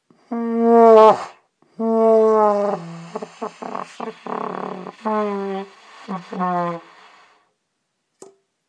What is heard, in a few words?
trumpet